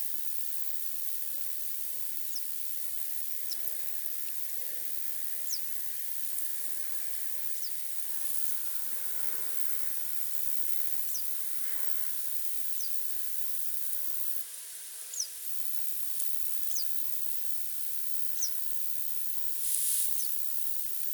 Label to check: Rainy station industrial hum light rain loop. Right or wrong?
Wrong